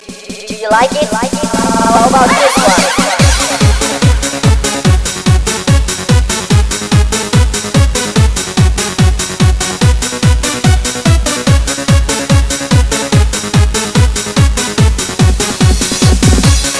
techno; Tetris

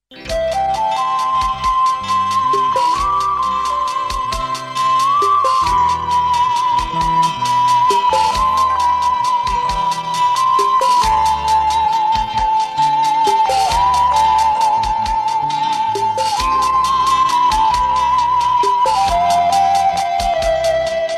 Bangla Prelude

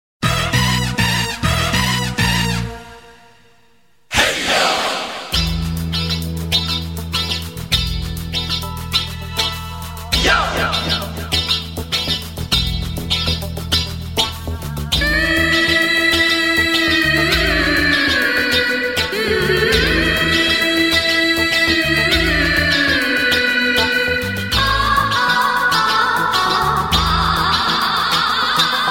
Dance Beats

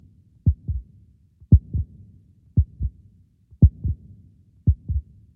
Heartbeat

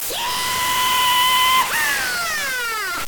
Yihaaaa

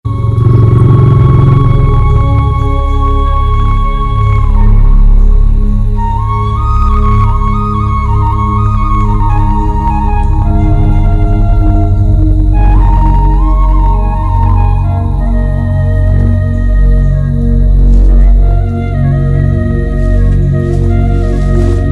Awesome Flute

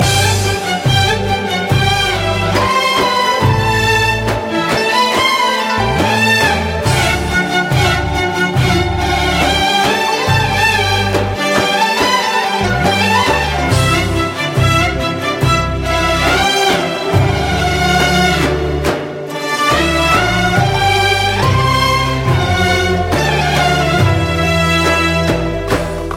Violin; Nokia N95

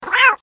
Kitty Meow

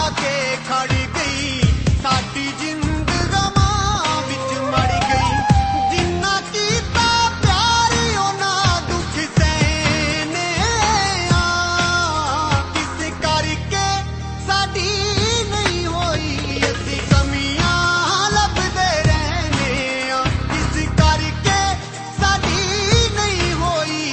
Punjabi Sad

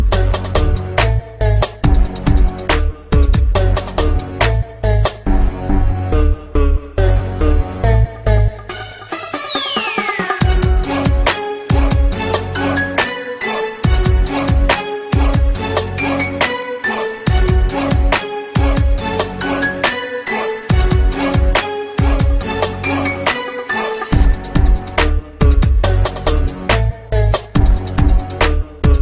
Gangsta Beat

